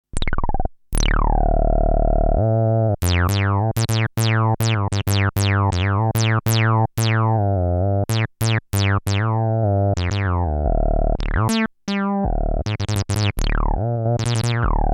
Moog; Vinegar Moog

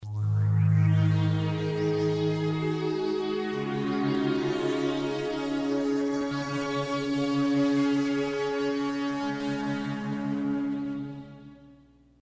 Harmonic Pad; Kris Rhen Presets

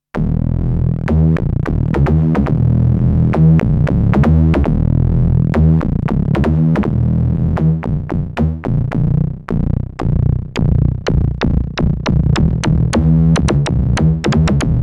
Modular Bass

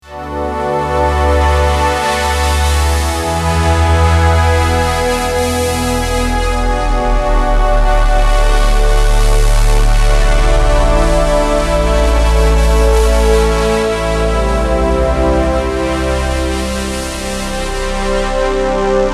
Stupid FM Band

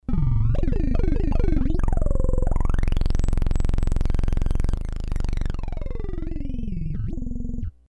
Electrobass; Kris Rhen Presets

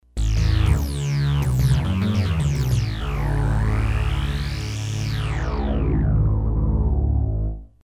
Dual Rezz Bass; Kris Rhen Presets

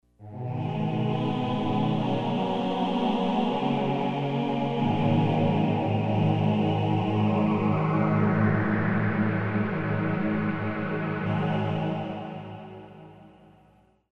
Male Quire; Kris Rhen Presets

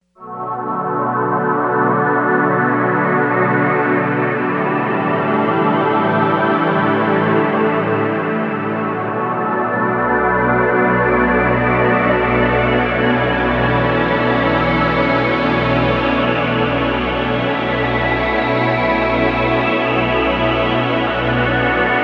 PAD Ice Space

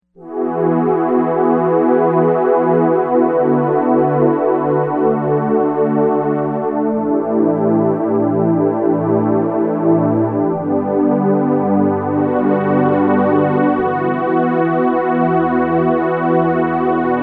Fuzzy String

